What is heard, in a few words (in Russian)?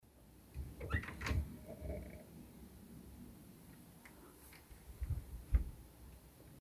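платяной шкаф с доводчиком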